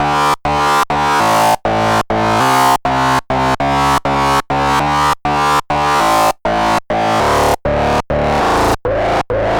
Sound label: Bass Deadly